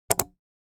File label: mouse click